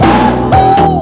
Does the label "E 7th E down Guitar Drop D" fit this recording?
No